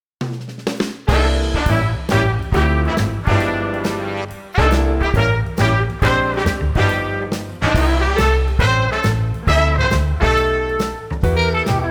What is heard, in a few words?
Trombone Solo